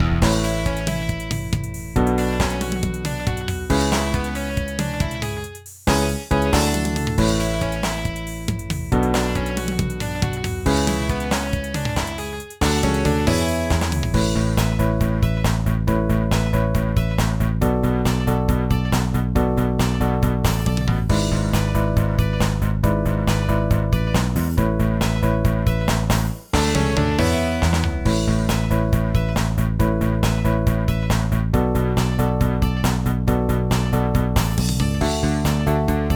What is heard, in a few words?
Pop Stage Karaoke; pinky street kira kira music hour pop princess gamerip